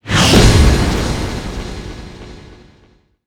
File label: big win text basic; netent; starburst xxxtreme